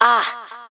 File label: Aww